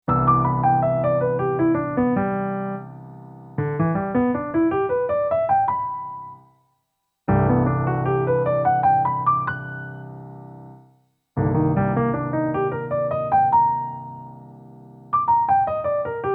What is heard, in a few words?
6th 7th chords Arpeggios Alternate Sustained Chord